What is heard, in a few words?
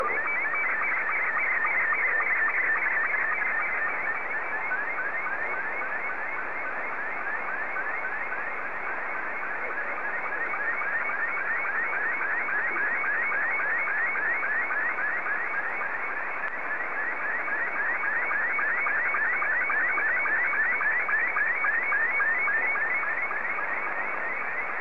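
SSTV, Scottie 2; JA3QJQ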